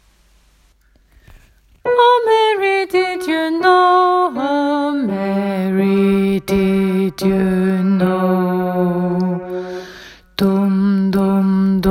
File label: bass; åfjord